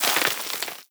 debris sand, bullet impact debris

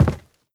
step light, footsteps cardboard

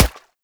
water, bullet impacts; physics; Half Life Model Pack

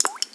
rain drip; ambient

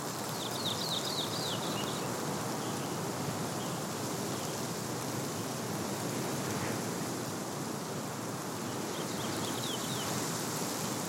bird hole, wind; ambient

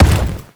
land, foley

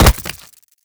body impact, headcrab black movement

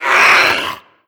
pain, headcrab reviver; creatures; vox

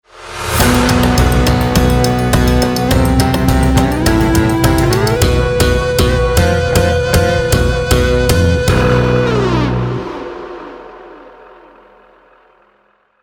round ten sec count, music

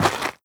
step light, footsteps, gravel

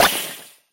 spew, infestation, bulb

